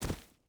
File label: footstep barefoot gravel jump land; Rust